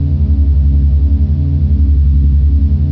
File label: DRONE